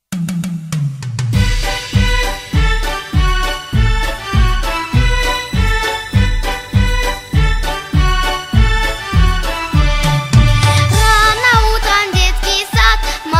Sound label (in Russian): detskiy sad